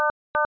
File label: Monitor Warning Tone, IP Phones; Cisco; 7942G; English; IOS